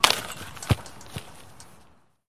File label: Soccer goal net hit